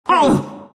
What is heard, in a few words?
broo pain; broodmother